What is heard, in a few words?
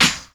CLAP, Dance Drums